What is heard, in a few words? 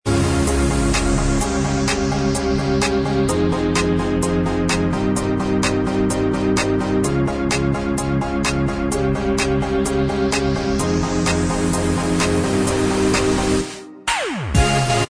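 Instrumental Track, backing tracks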